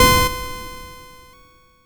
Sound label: Perc, Nord Modular Drum Samples; Yawgmoth